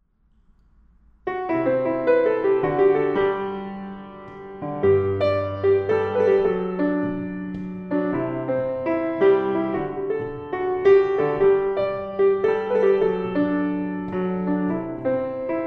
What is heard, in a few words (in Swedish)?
polska; Marias polska